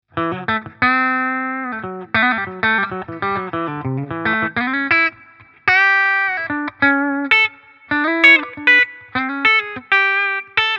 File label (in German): Clean Steg erst HB dann SC